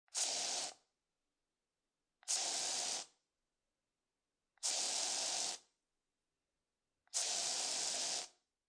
Foley, Aerosol Can Spray, Multiple Medium Length Sprays; Spraying